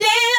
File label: Ebm Yeah